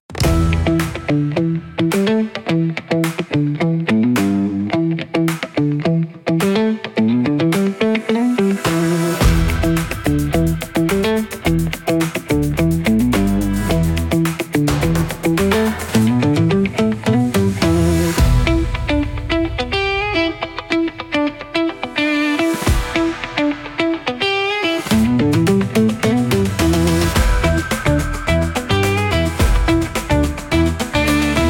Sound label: Pop